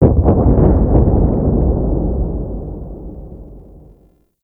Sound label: Distant Asteroid Impact, Fortress Ambience; Warframe; Grineer